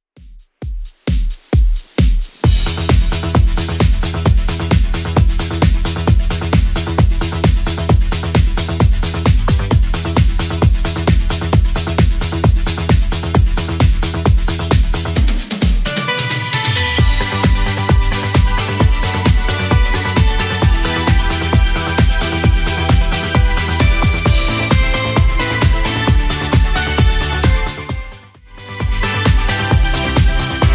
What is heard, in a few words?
80s Redrum 132bpm